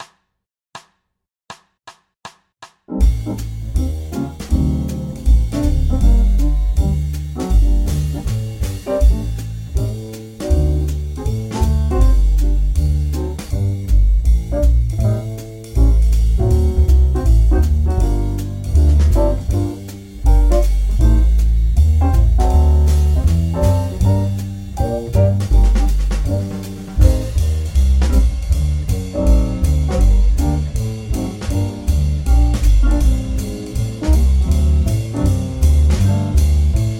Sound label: Am7b5 D7 Gm7 (4bars Loop) 160